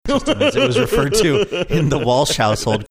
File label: funny laugh